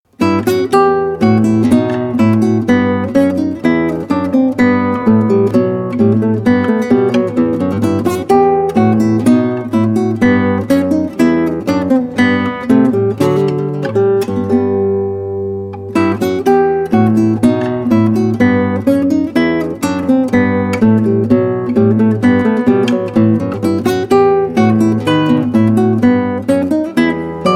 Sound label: Bourree